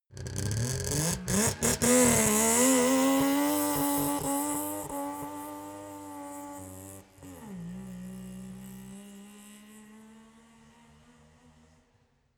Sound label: car saab 92 exterior take off